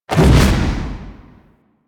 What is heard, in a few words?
Reaper Jump; Warframe; Wraith